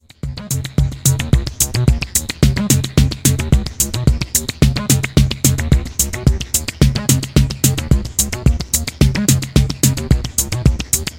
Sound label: rhythm